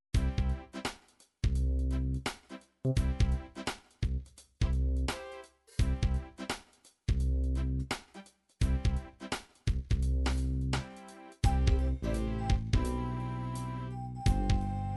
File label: 16 beat, midi